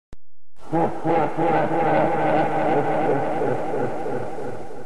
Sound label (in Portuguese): risada